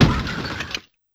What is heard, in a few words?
Car Crash, Yamaha PSR-E323 SFX Kit